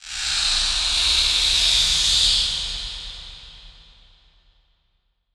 Production Element Title Transition Demonic

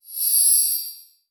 Digital; Adobe; Multimedia Internet CD-Rom Flash

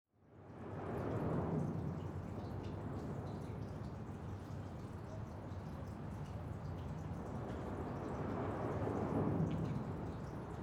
Ambience Traffic Under Bridge; AdobeCC

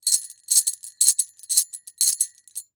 Bells Jingle Sleigh Bells Short; Foley

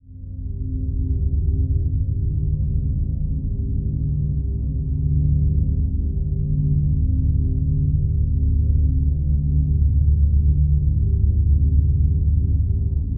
Drone Dirge Mellow Sad Somber; Production Element Imaging; AdobeCC

